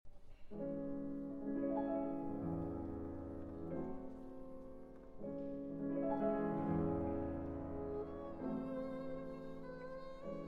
Piano Trio in A Minor; Op. 50, TH.117; Tchaikovsky; Var. IX

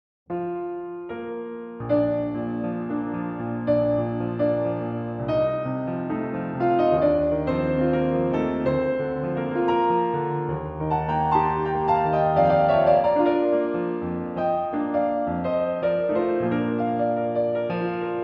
Solo Piano; Adelaide, Op. 46.; Liszt

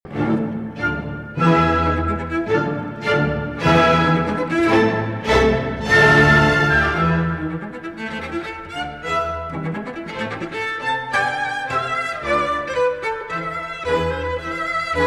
Cello Concerto in A Minor, Sehr Lebhaft; Schumann; Op. 129

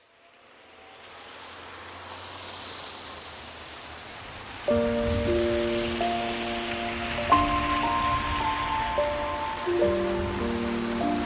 Piano and Rain

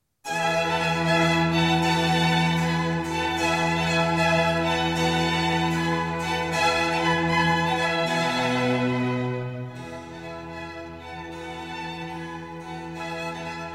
Violin Concerto No. 1 in E Major, Allegro; The Four Seasons; RV 269; Spring

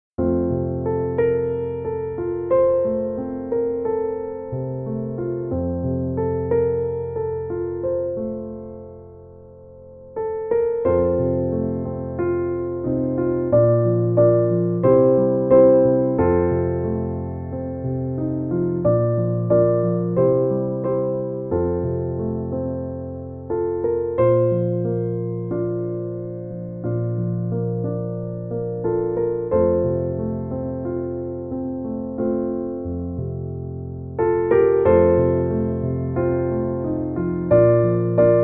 Instrumental Piano

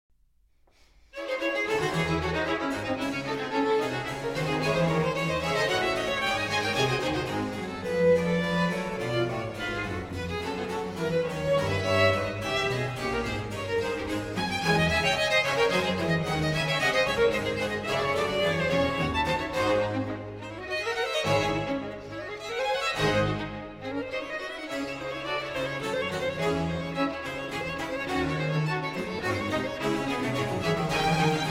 Concerto for 2 Violins in D Minor, Allegro; BWV 1043

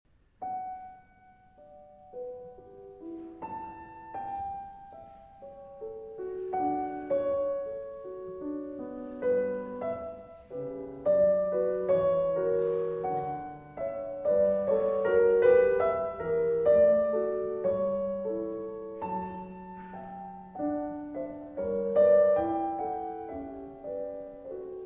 Piano Pieces, Intermezzo, Adagio; 4 Piano Pieces, Op. 119; Brahms; Live at Church

